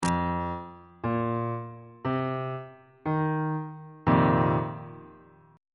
f dominant 7th suspended 4th chord on bass clef

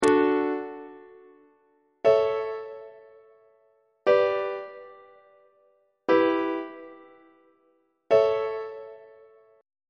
d major half cadence on treble clef

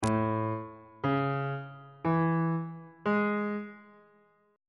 b dorian 7th chord 7 is a major 7th

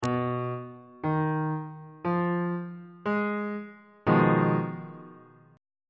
g sharp minor 6th 1st inversion on bass clef

